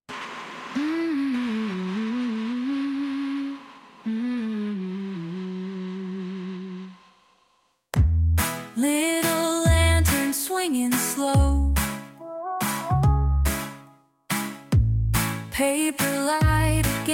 birthday, name songs; leslie

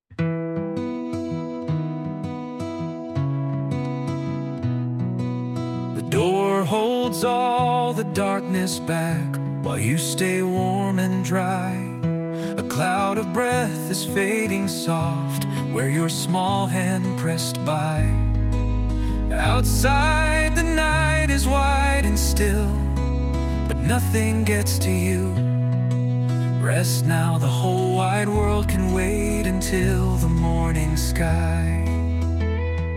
lullaby, name songs

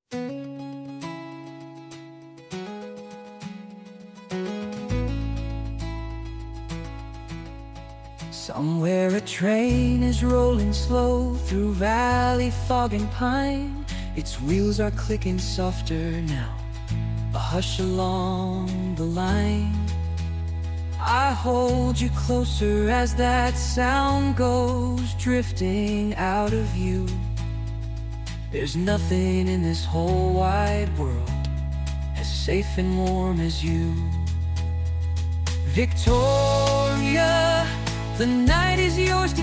victoria lullaby

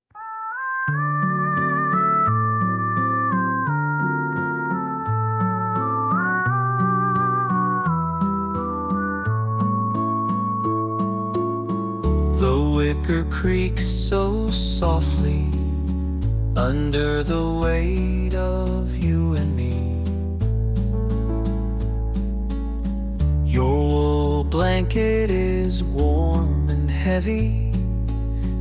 tanya lullaby

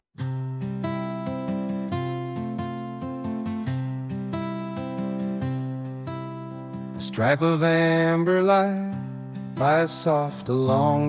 vicki lullaby

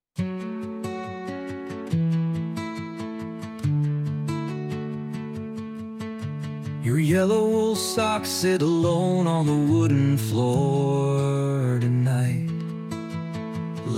lullaby, name songs; rebecca

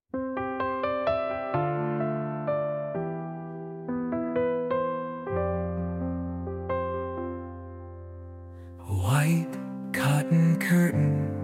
lullaby, name songs; rupert

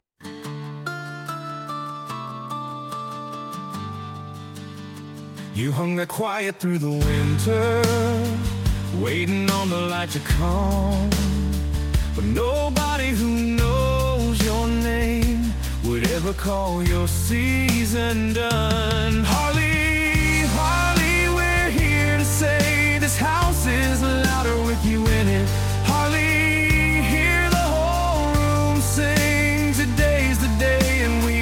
birthday, name songs; harley

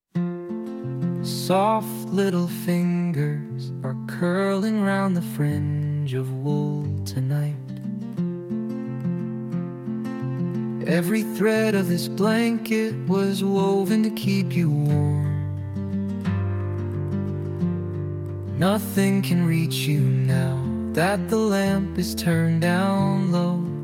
shannon lullaby

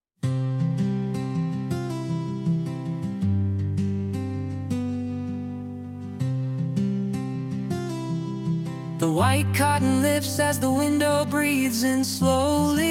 wendy lullaby

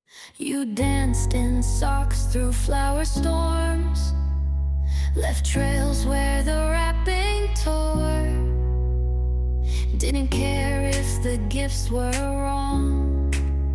birthday, name songs; cyrus

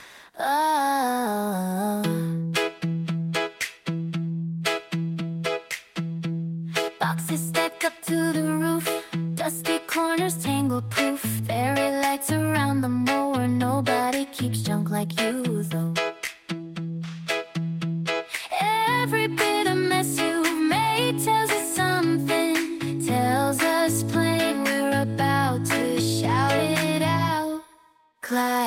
birthday, name songs; clive

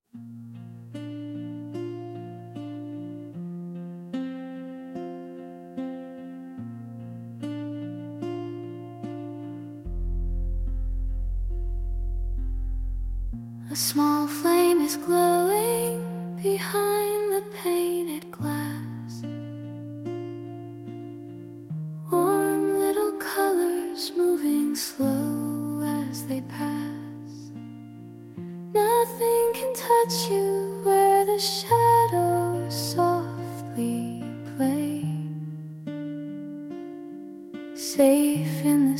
lullaby, name songs; harmony

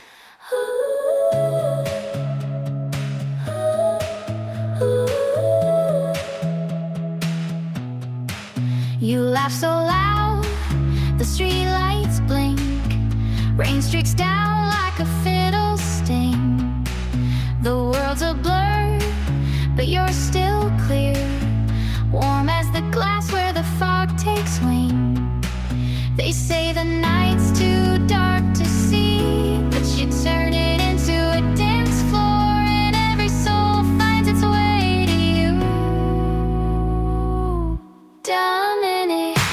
birthday, name songs; dominic

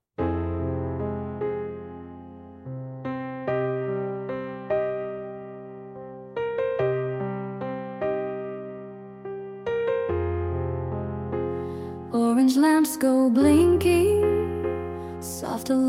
lullaby, name songs; barbara

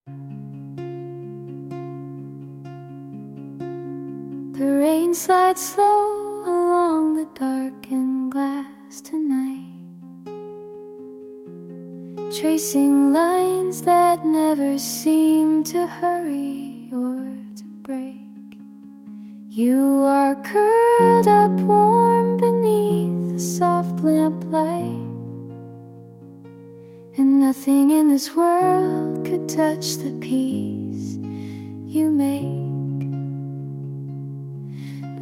sarah lullaby

